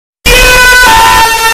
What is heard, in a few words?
Scream - Short